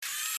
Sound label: arrow frame pop; Looney Tunes - Avalanche Snowboarding